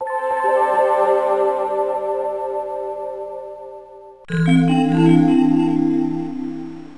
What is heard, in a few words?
song